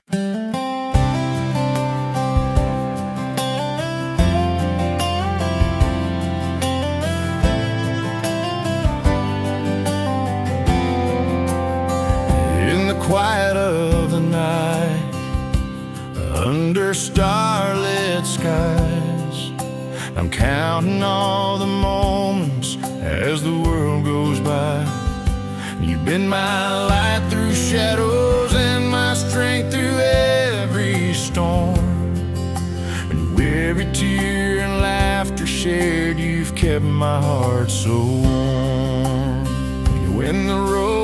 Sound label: aniv song